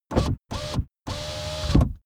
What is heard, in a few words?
car window back close unsteady